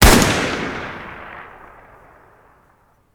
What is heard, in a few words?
sv98 shoot, weapon; metrocraft